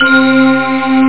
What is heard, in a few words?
Pin F Bell String; MarcDrive